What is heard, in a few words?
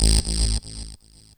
saw lead synth